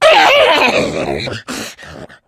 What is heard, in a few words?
jockey death, voice; Left 4 Dead 2